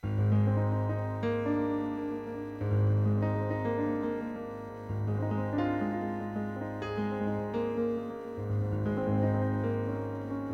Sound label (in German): Osterglocken (Improvisation), Klaviersolo